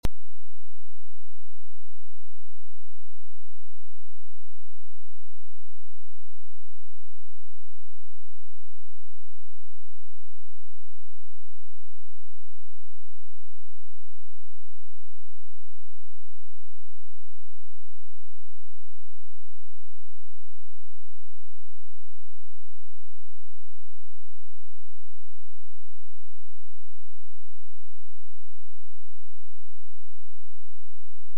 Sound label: Scellan anthem